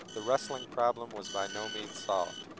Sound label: noisy; 10dB